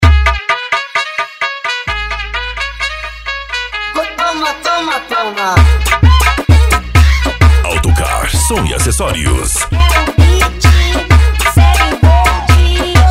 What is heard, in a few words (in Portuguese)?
funk agressivo ritmado e funkadao